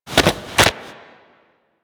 Rifle Pickup Wall; Warframe; Pickups

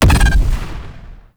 Glaive Detonate Explosion; Warframe; Weapons; Ghost Catcher

